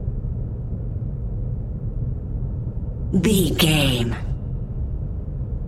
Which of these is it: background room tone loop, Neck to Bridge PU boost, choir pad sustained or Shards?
background room tone loop